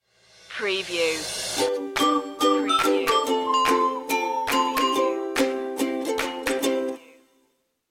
jolly ukulele stinger

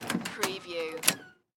Door Open

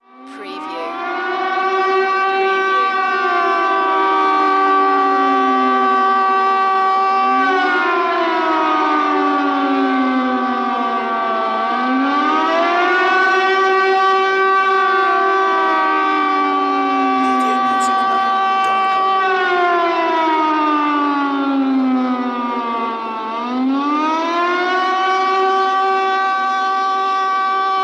Air Raid Siren Strong

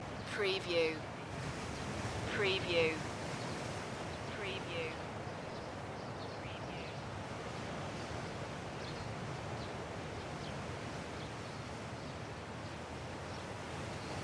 Ocean Waves with Birds Loop

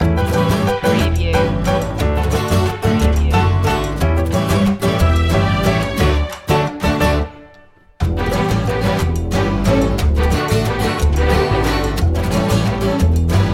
go mariachi go underscore

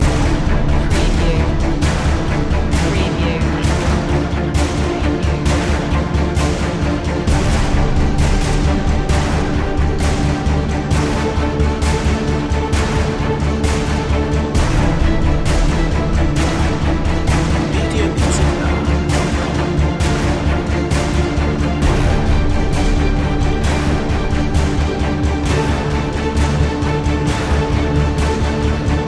droid attack loop

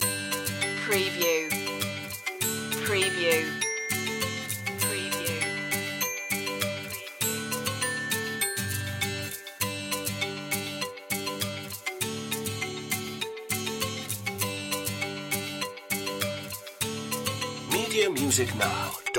skip to my lou loop

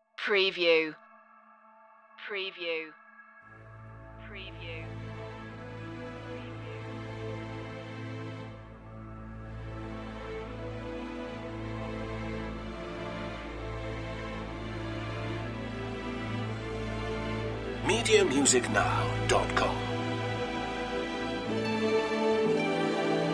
choir no solo vocal

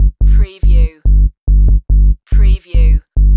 Techno-Trance Sub Bass Loop in G 142 BPM

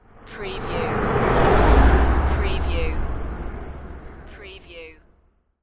Car Drive By

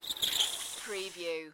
Bicycle Skid

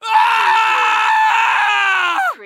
Scream Male Long; sound effects bible

